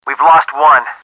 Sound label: lose; dynAMIte